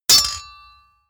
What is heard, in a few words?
sword hit metal